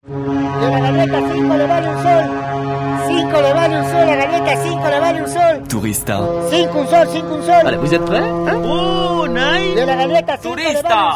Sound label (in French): gingle radio suisse